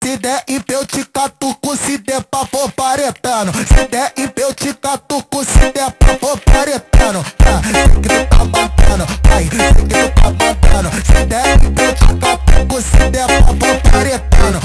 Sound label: phonk montagem